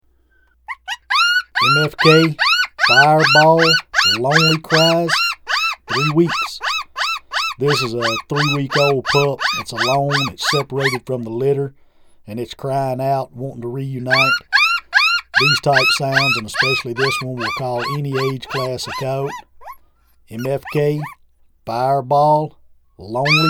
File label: Lonely Cries 3wks, game calls; MFK Fireball